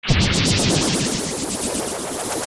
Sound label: Combine; OOT